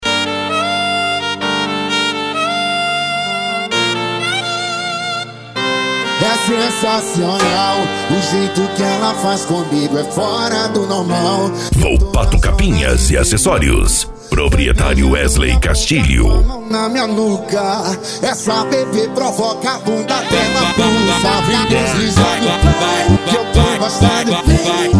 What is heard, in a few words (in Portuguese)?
funk consciente e hip hop